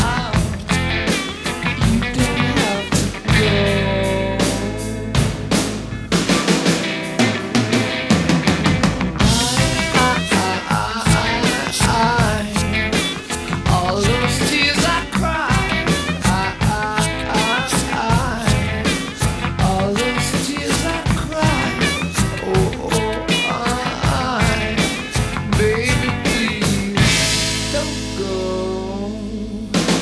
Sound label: rock